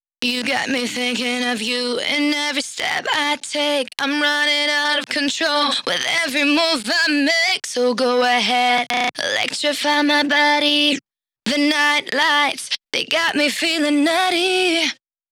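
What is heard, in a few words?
Female Vocals Verse 2 DRY, 128 BPM; Vengeance Samples; VTDS2 Song Kits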